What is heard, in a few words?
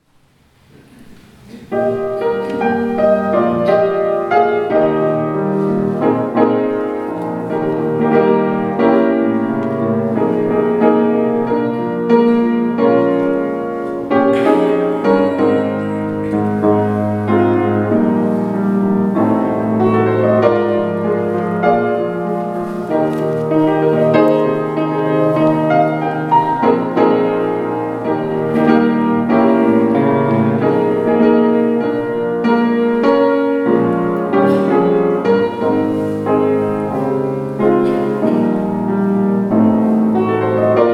Piano; cantilena